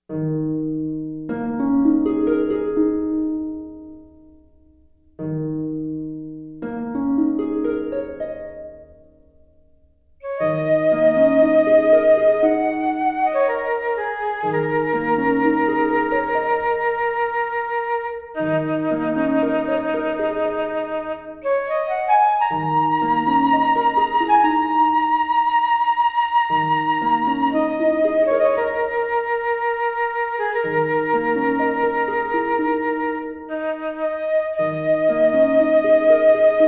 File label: Harp flute G; Harunoumi